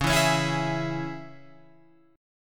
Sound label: C sharp Minor C sharp Guitar Open F, chord; strummed; 13,11,13,11,11,11; JGuitar